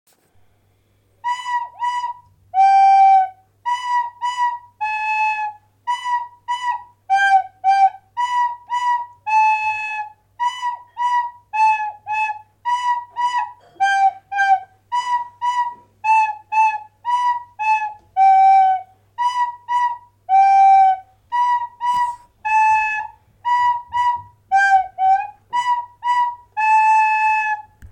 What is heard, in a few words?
VIOLA; musica attivita svolta durante la didattica a distanza